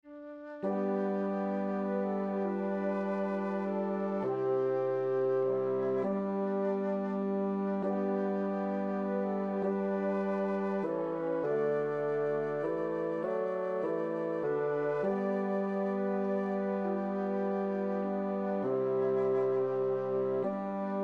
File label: amazing grace basse